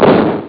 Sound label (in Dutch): kogel; arcade; marathon